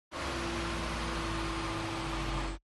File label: TRANSPORTATION CAR BMW M4 Idle Engine Hot